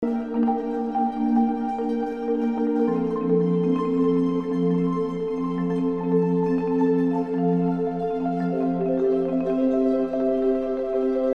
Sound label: plucks